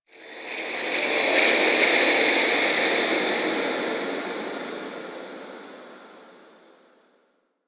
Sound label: Factory Texture, Ambience; Warframe; Quests; TheNewWar; Zariman